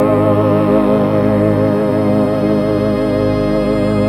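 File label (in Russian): орган одиночный яркий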